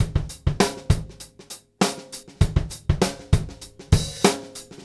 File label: Straight Kit, World Pop Beats; Straight Kits; Sonic Foundry (Sony Creative Software)